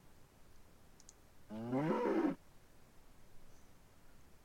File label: Animal Call Cattle